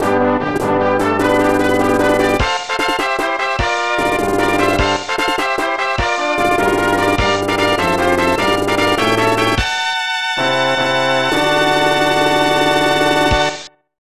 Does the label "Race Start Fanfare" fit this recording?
Yes